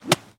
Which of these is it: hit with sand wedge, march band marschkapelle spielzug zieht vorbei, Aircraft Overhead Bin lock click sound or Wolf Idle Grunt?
hit with sand wedge